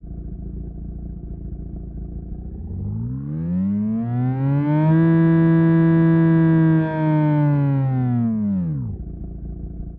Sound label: rotary x8, engine sound